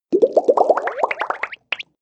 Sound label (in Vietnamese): Âm thanh thả tim facebook